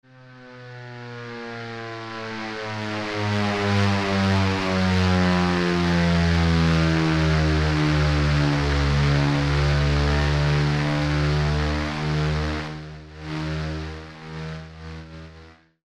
Sfx Bombs Away, Alesis Micron; Factory Programs

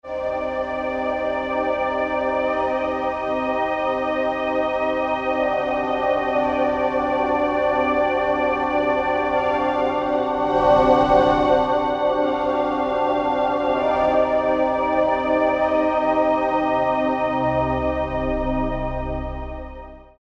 Urban Pad, Roland SR-JV80-06 Dance

